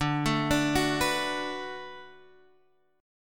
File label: D 6th D down Guitar Drop D, chord